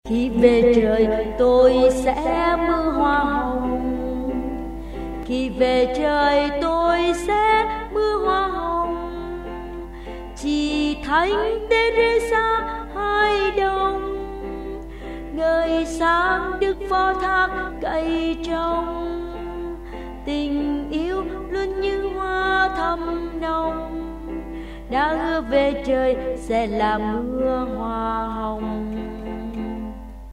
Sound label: alto basso